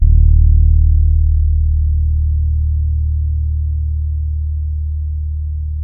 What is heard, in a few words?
SAMBA, Bass; Spectrasonics; Bass Legends; TYLER SAMBA